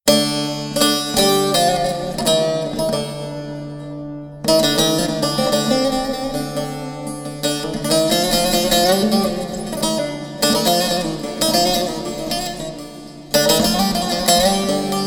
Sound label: Solo